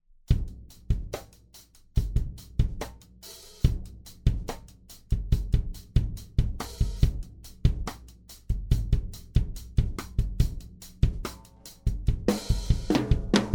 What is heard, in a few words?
Drums sE7 OH